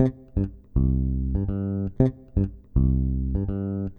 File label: Latin B; Jazz (Джаз)